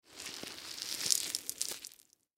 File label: Horror Guts Squeeze Drip Blood Gore; Dripping; Blastwave